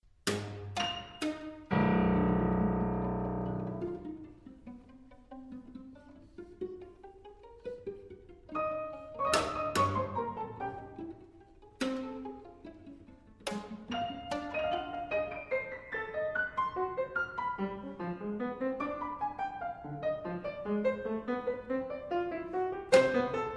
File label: piano trio; auerbach brown; mvt 2